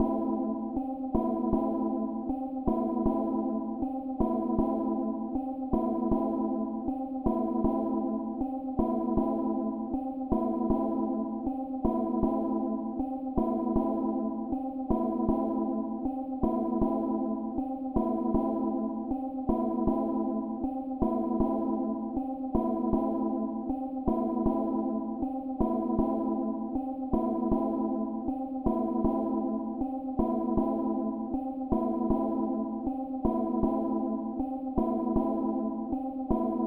157 BPM A Min Synth Bells, Loop Stems; Melodies; Melody Mystery Expansion; Frost

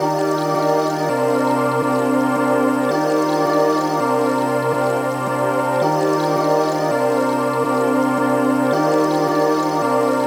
165 BPM G Min Chords; Melody; Loop Stems; Cymatics; 2022 Melody Collection - Wavy; Painless

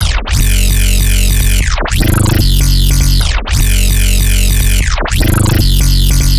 Bass Loop F#, Dubstep, 150bpm; Jupiter; Ghosthack; Dubstep Sound Arsenal

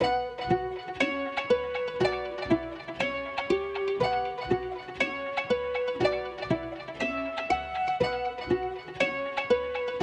120 BPM B Min Guitar, Trap; Melodies; Loop Stems; Bazaar

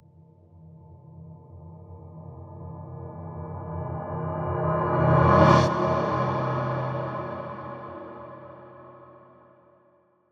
Reverse Bell; Ghosthack; SkyRealm

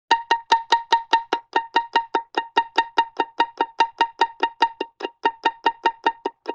Pizzicato Loop, Vintage Cartoon; Musical Elements

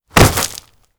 BF On Gravel; Fairlight Sound Lib

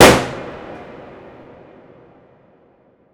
impact hatch; Hits

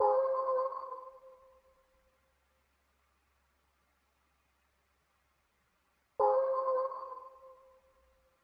155 BPM F Min Hit; Loop Stems; Cymatics; 2022 Melody Collection Platinum Expansion; Warped

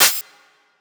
Hihat; Ghosthack Advent Calendar Day 4

